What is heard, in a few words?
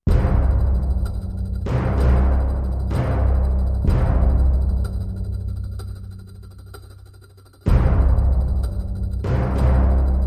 Percussion Only